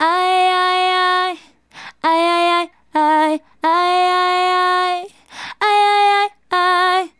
Female Solo, Hip-Hop and RnB Vocals

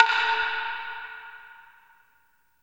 J PERC, JAPAN PRC; USB Soundscan vol.60; Asian Traditions; AKAI

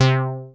Analogue hits and octaves; FM 57 - The Ultimate Sample Collection (1997)

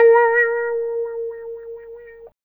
guitar, C guitar

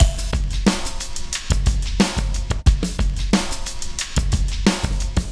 Club Tip, R&B Drums

